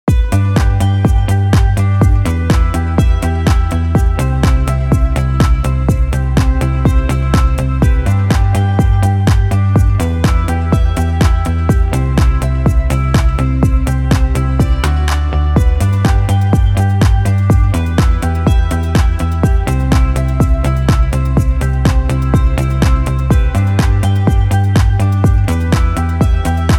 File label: Under The Sunlight Underscore, dreamy; Narrative Mind